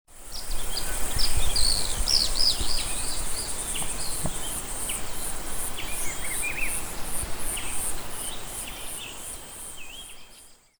Chaetura cinereiventris Andorinha de sobre cinzento, Macaé RJ; Macaé RJ RPPN Shangri-lá Entorno